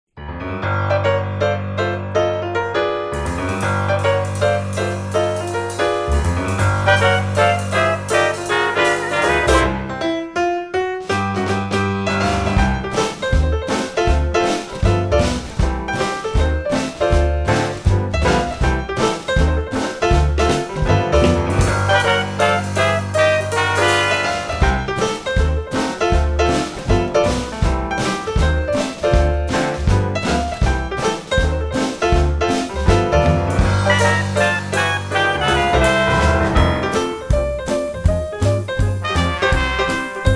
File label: playerpiano